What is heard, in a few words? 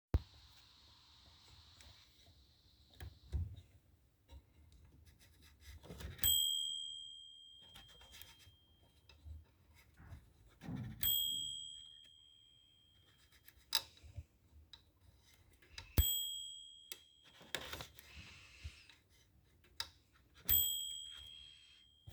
ding